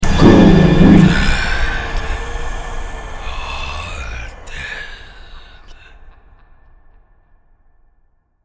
Zombie win; cstrike

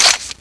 clip in, usas, weapons

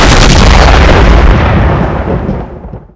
mortar hit; firearms; thanatos

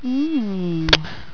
kiss; tfc; knight

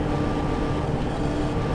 lab drone, ambience; gearbox

